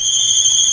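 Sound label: MINOR TRILL G1, Strings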